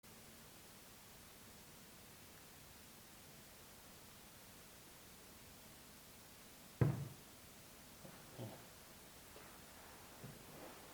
Thud Ballroom; Wausau Club; EVP

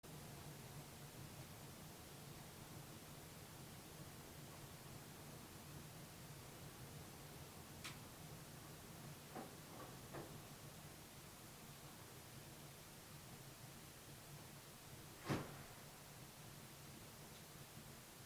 knocking, slam; EVP; Devoe House

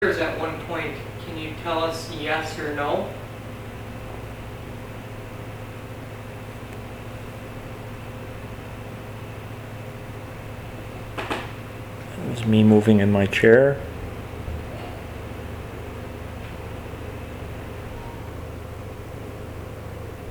Giggle In Bar; EVP

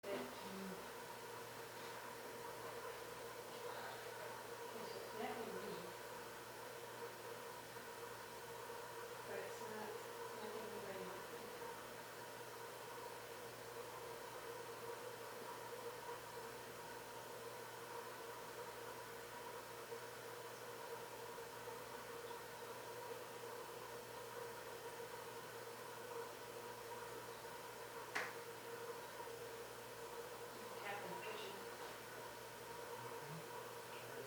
Sigh, loud tap kitchen; EVP

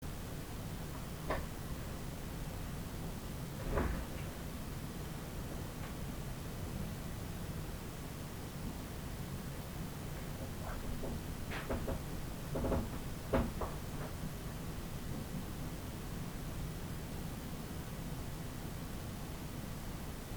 Footsteps and Creaking; Devoe House; EVP; W. Devoe Suite

